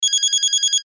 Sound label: cell phone ring